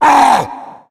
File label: growl; Left 4 Dead; Source Engine; video games; tank